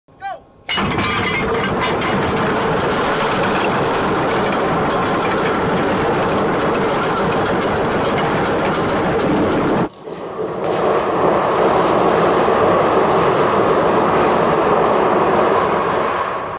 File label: ship brake